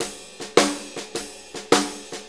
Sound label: Blues Loop, Drum Tools, Full Kit Loops